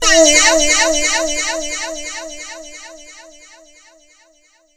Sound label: HEE HEE, ADLIB MEN, Funky Vocals; USB Soundscan vol.18; AKAI